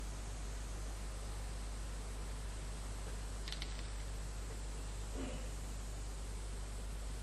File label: window